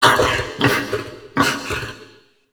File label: wretch tunnels sight; A Machine for Pigs